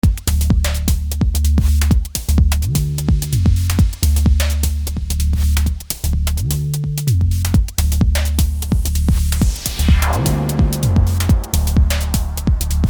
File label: big wave